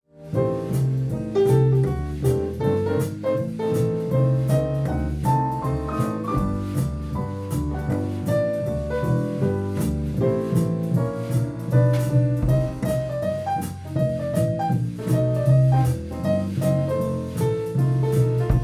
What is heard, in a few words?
uptempo, band; PCM-M10; 441kHz16bit